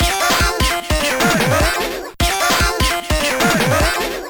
beatbox; Pinball Dreams

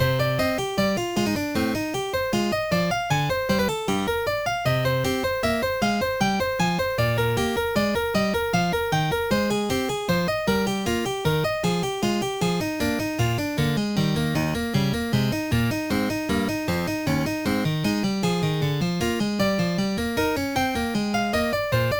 prelude; OctaMED MED

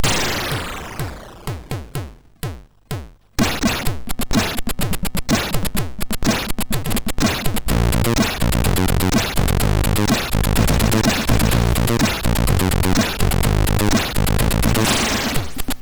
magic funk, MOS 6581R4; SID

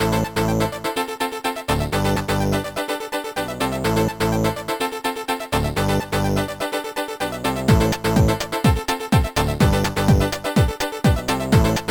noise sinus pop, chiptune

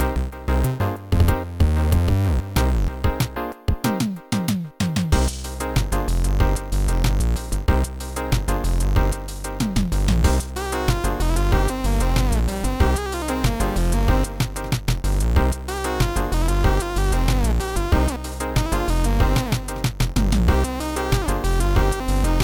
chiptune; emc chiptune no12

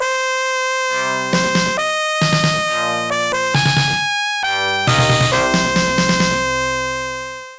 short defeat; Sonix Music Driver

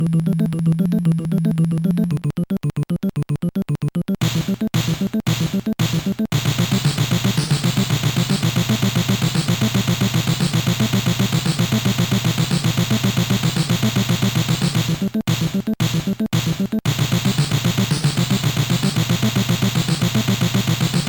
hardcore; Sonix Music Driver

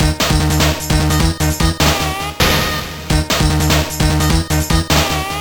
beatbox; pinball dreams; Blaizer

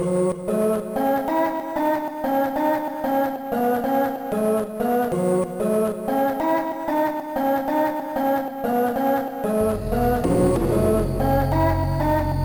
mod pipe tuning; Trackers; Modland

